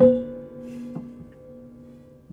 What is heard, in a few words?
Prepared Piano; Battery 3 Sample Kits